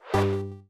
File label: game show quiz bonus move